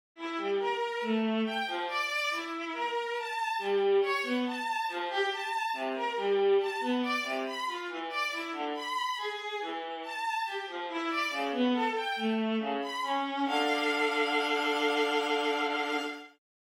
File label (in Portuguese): Melodia Trio Espalhada